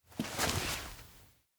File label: get up dogeza; chiharu; anemoi パッケージ版 1.1